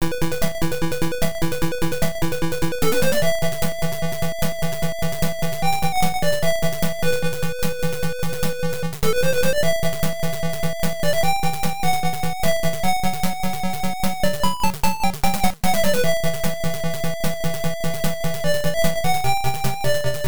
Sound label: Dungeon; Undeadline; MSX2; PSG